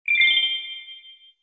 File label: coin; Cute Monster Jump